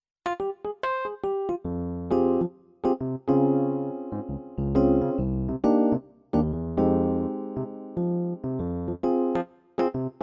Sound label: CTK4400 E Piano